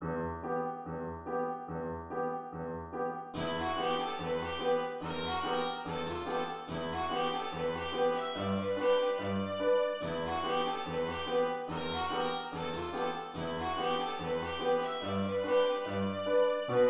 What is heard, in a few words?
in the hall violin